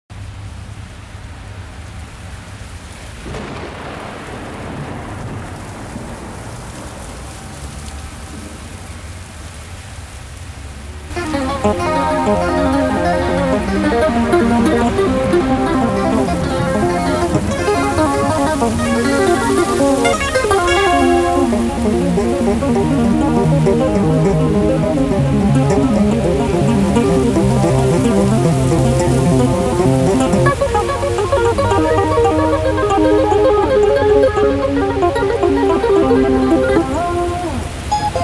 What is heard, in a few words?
rain instrumental